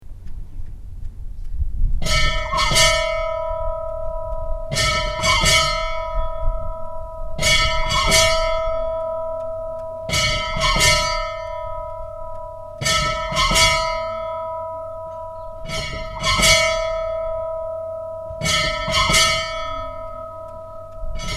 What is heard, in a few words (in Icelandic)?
stadarbakkakirkja messa upphaf, kirkjuklukkur